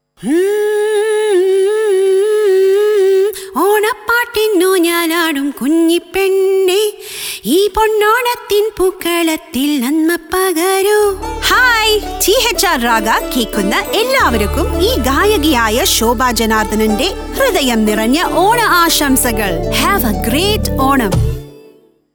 Onam song and wish; THR Raaga

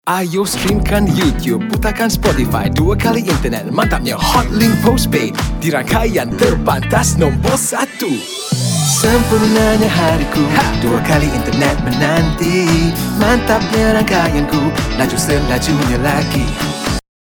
BM RAP SING

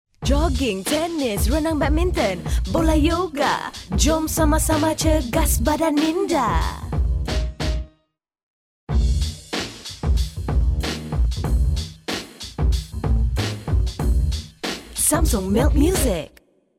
Hip Hop; SAMSUNG MILK; Kelana Jaya

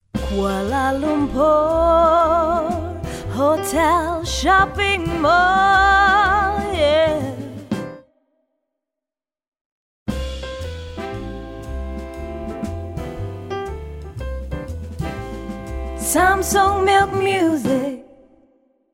Jazz; SAMSUNG MILK; KL Sentral

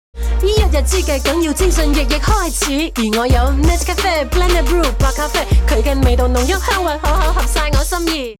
nescafe blend and brew rap

